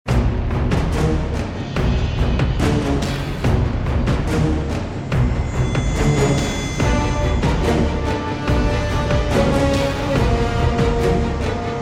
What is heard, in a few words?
bgm forest remains; dragon nest; stage2